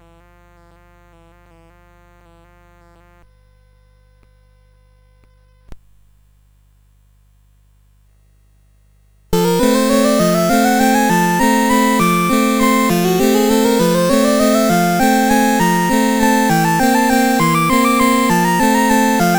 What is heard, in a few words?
CSG8580; Valse Chromatique; CSG8580R5